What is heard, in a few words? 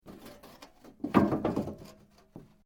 Metal Sheet Move; Materials